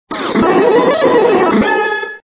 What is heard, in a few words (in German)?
klavier